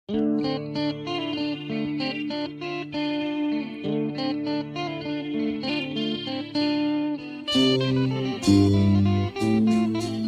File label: Character Theme